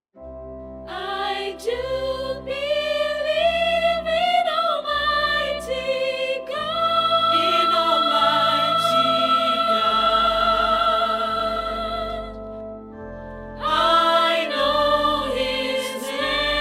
Dynamic OrganTeq Choir

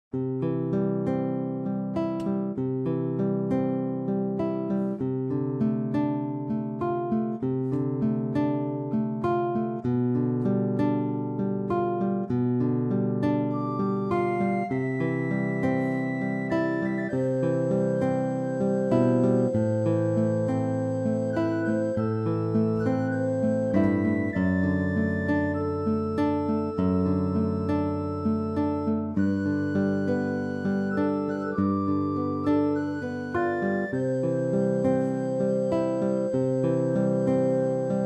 Guitar Organteq

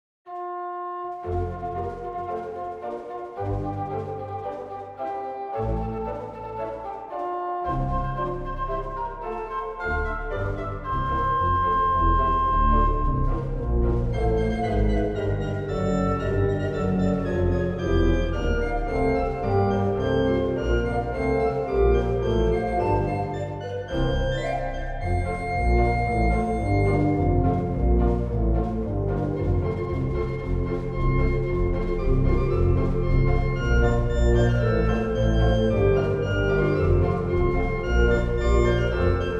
Pop On Organ

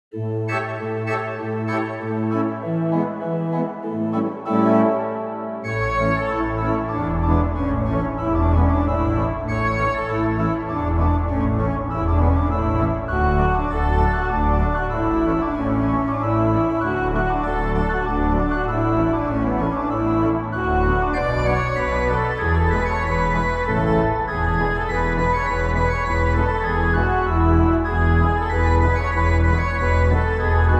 Polska 3:4 beat

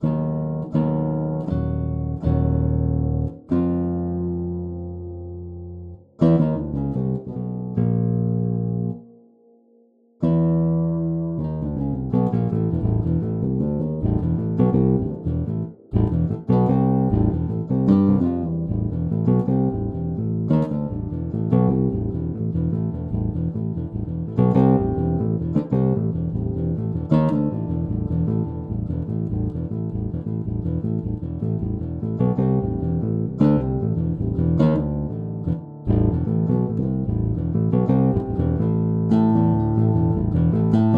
String Bass

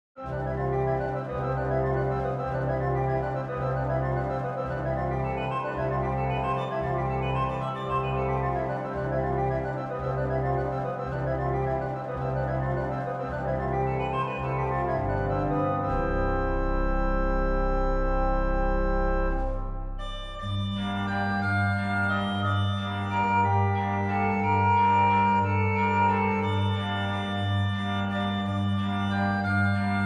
Piano Grade 1 Finger Exercises Ideas

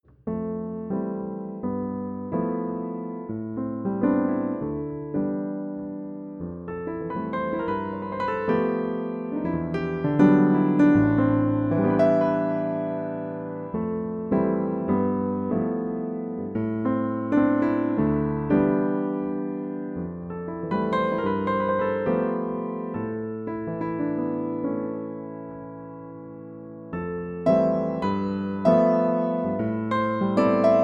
U4 Powerful Jazz

